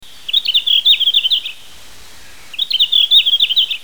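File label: red-flanked bluetail; ruribitaki; toritori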